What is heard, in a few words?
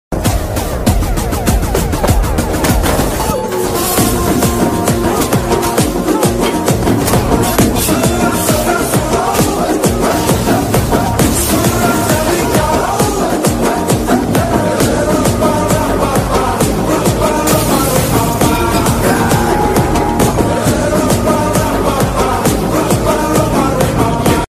trailer bgm ringtone, mass bgm; guntur karam; mahesh babu